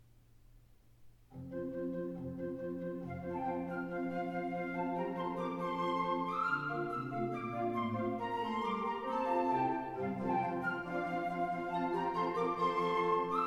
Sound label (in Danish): Tambourin